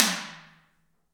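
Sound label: T DRM, talking drum, Heart of Africa; Spectrasonics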